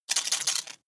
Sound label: metallic rattle, robot; comedy cartoon